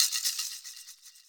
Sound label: EQ Perc, Percussion Mix